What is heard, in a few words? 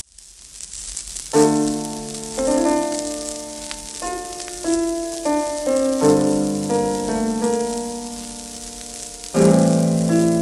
sonate violoncelle